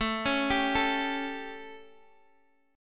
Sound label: A 7th, chord; arpeggiated